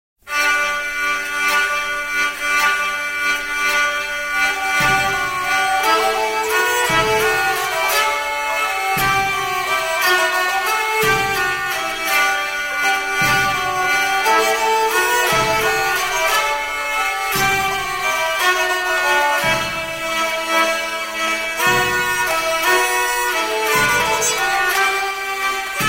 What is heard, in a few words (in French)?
quinte estampie; les periodes artistiques